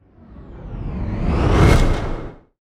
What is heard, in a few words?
Riser Hit